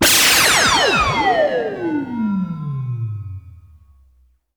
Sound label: OBLITERATE, LASERS STABS; ILIO; Trance Fusion